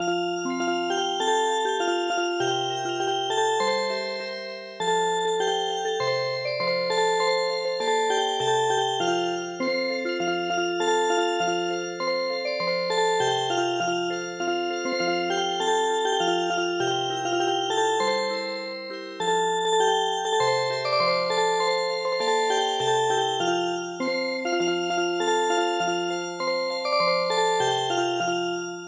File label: Music Box, traditional; 田村虎蔵の「金太郎」に癒し系のアレンジを施したオルゴール曲を聞くことができます。; 「金太郎」は、ミディアムテンポな4拍子の日本の伝統曲です。